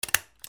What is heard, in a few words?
OFFICE